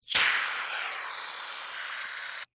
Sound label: Shaving Cream, Spray; Household; Spraying